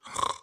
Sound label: snore, creature